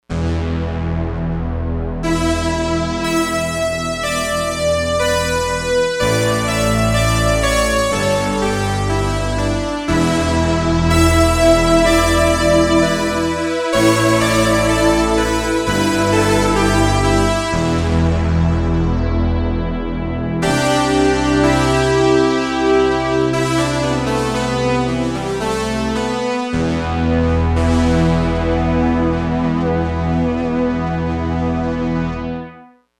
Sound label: Twelve Moogs, synth